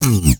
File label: BASS SLID; E-MU Formula 4000 Series Vol. 1; Hip Hop Nation; Phatt 4000